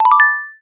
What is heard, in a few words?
combo succeed, Casual Games; G4F SFX